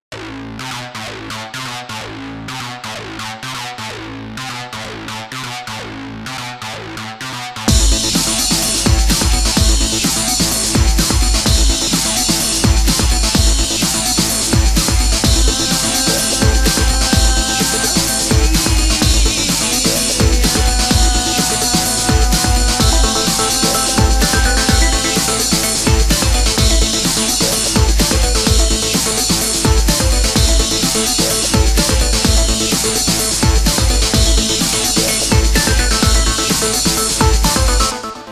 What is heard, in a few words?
breakbeat